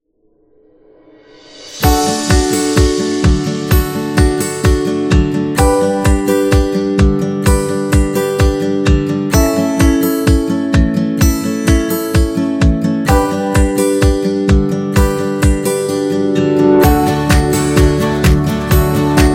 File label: Guitar Uplifting